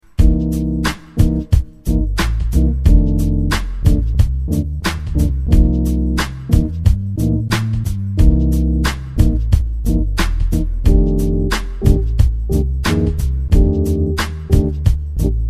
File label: funk jazz